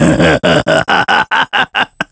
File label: Victory; Ganondorf's victory voices